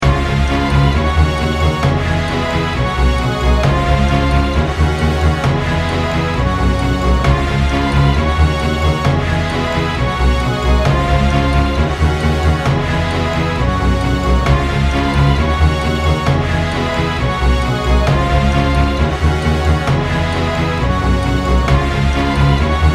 song instrumental

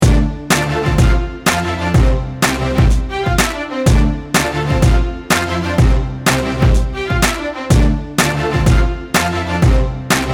instrumental

